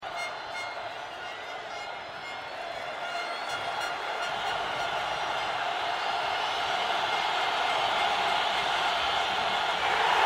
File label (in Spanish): PARTIDO DE FÚTBOL PERÚ VS ECUADOR FIN DEL PARTIDO PERÚ GANADOR